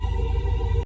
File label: loop